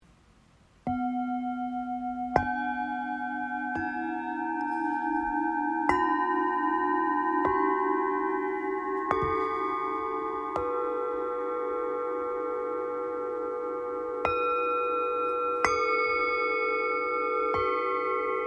Set bol bengale

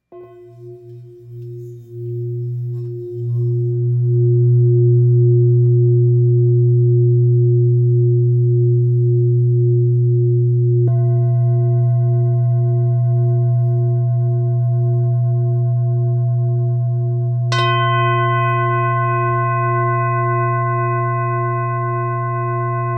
Bol devjam fa

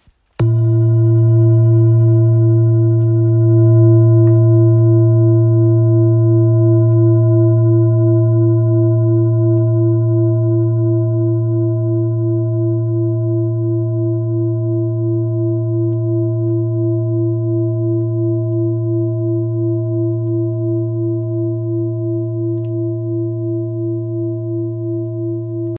Bol grave fleur vie fa diese

